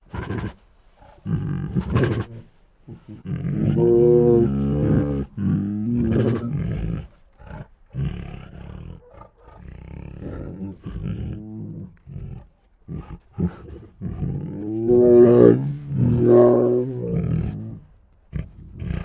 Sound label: Two Tigers Make Grumbly Growls and Moans; Sound Ideas